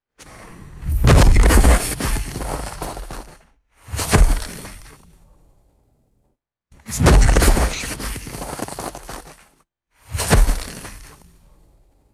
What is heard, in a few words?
heavy body falling into crunchy snow